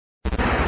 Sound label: tank Crack; battlecity